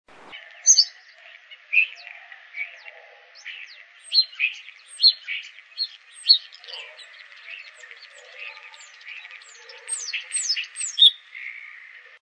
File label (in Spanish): voz estornino pinto